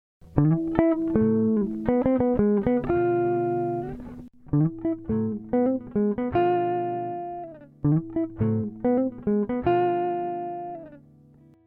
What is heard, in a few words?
riff intro